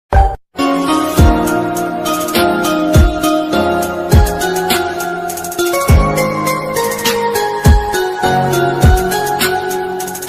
arabic ringtone islamic ringtone turkish ringtones bgm ringtones